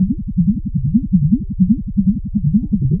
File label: Noise, LFO & Noise